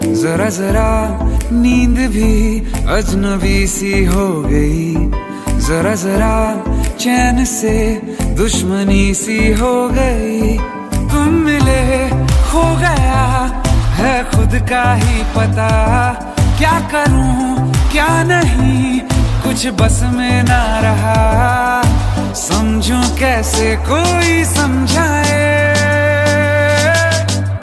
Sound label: hindi latest bollywood ringtone